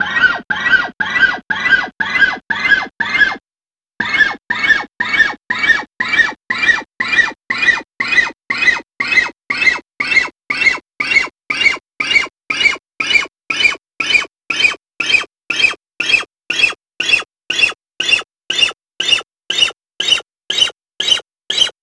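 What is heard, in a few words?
fret noise scale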